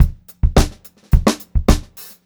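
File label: 106 groovy variation 2, funk groove drumloops; USB Soundscan vol.38; AKAI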